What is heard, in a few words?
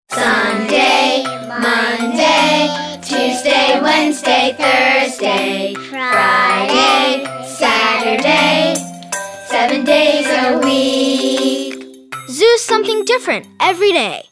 Kid Song Toy Marimba; LouZoo